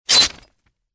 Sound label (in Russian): udar po telu s metallicheskim prizvukom; Звук мечей; Звуки сражений на мечах, звук ударов меча